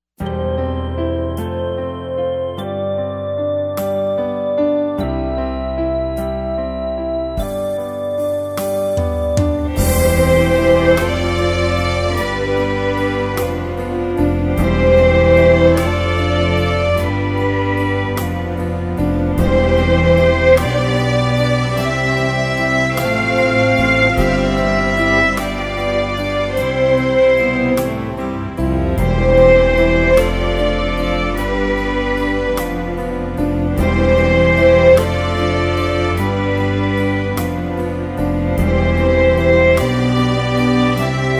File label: ballade in C